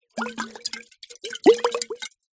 food drink wine bottle shake lid off wine inside; zapsplat

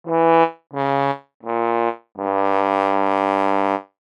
cartoon fail trumpet

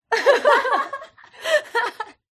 2 Ladies Giggle

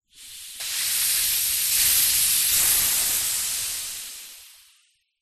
household water pressure spray bottle release air

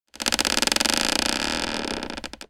office chair creak; zapsplat

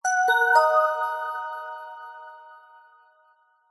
game sound zen game chime mysterious soft; zapsplat

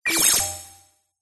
science fiction cyberpunk robot or equipment beep; zapsplat

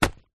household rubber glove drop down on surface

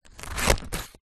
office scissors cut paper close up; zapsplat

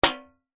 impacts metal tray hit muted hard

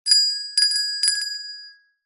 bell small hand ring short x3

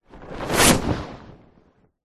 superhero fly by fast whoosh cape; zapsplat

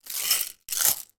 tube of sweeteners tip from side to side

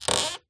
household door creak

